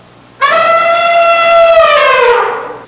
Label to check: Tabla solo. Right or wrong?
Wrong